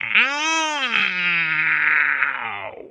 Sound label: ow